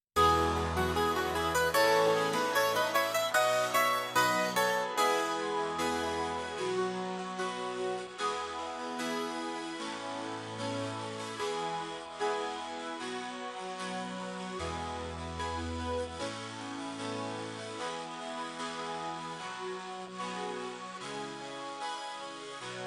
Synth

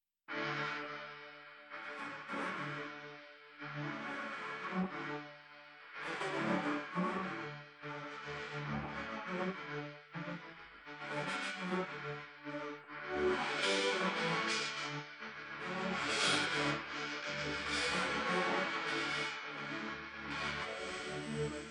Synth

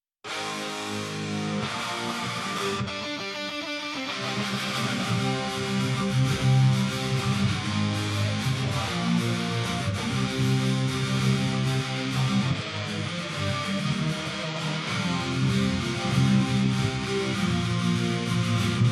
Guitar

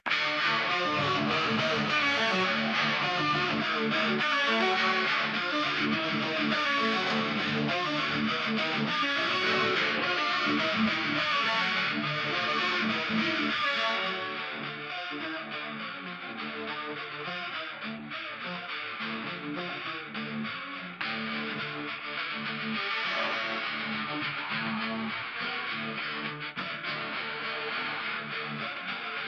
Guitar

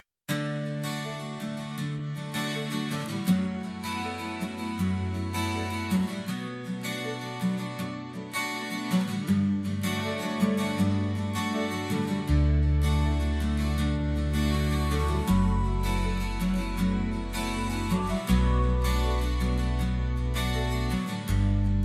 Instrumental

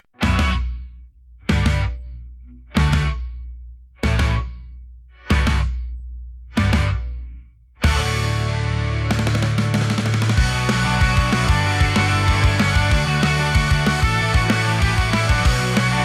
Instrumental

